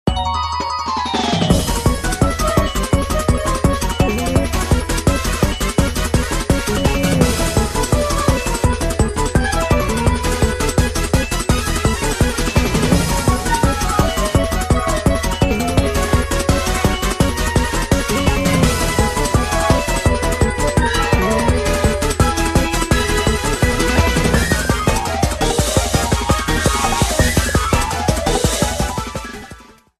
"Hurry! Hurry!" theme

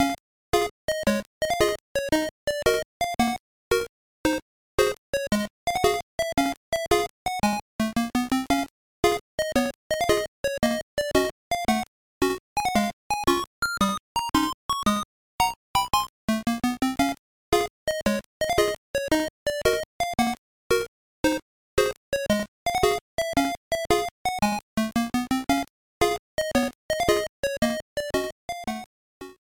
A music track; WL2

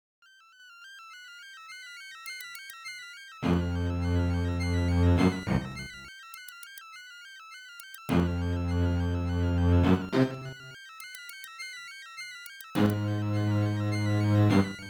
Music Theme; New Super Mario Bros.

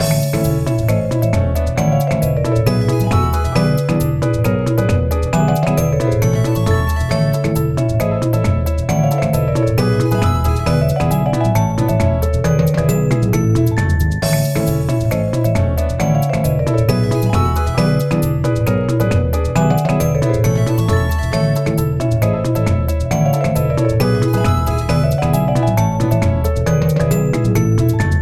theme

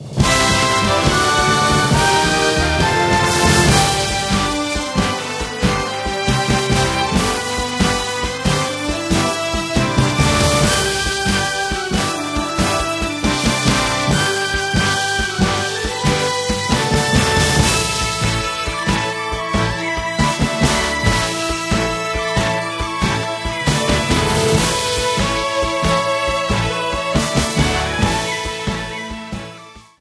Horse Racing - Menu

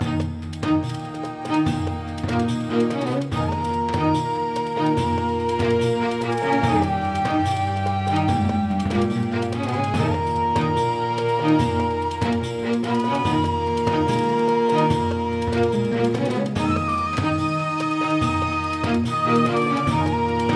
Desert Theme; SMM2; SMB

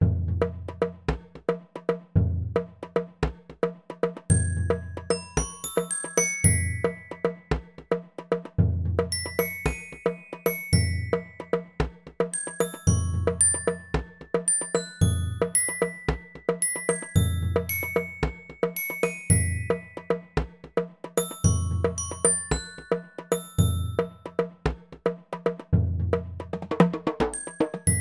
Night Theme

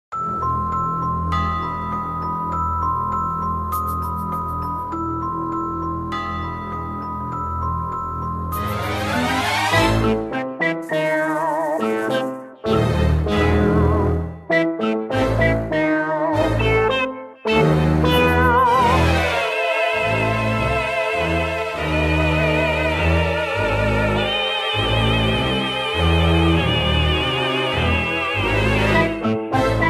Ghost House Theme; SMR

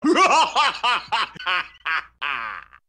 laugh, Evil laugh sound sampled.; Kroc

